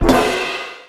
Hit

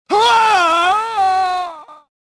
death; jedi

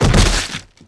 fall splat; player

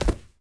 bodyfall marble; foley

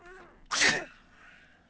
sniff, JAIL; AMBIENT; Pirates Of The Caribbean

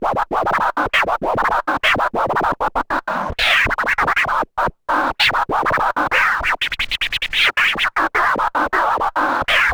Scratch; Matt Hoffman's Pro BMX 2; XBOX

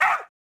Dog Bark; Project Gotham Racing 2

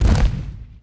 stone hit stone lg; objects; Star Wars Obi-Wan; XBOX

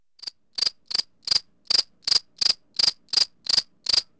grasshoper; AMBIENT; JUNGLE; Pirates Of The Caribbean

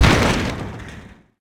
wood soft, Impact; Cars; XBOX

